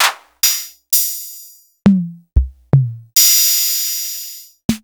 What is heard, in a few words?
Korg KPR77